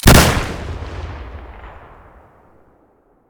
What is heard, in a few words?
bm16 hole stereo, weapons